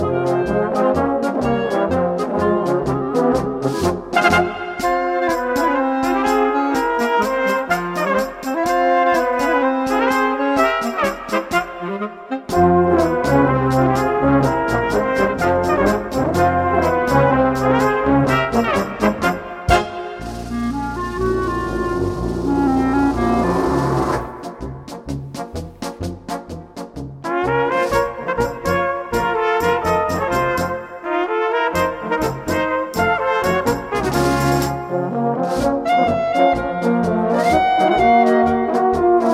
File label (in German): Polka Oriental